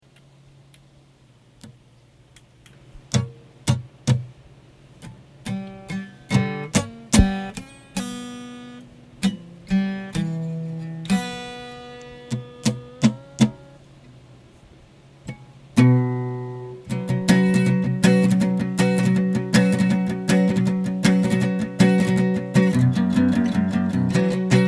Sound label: guitar instrumental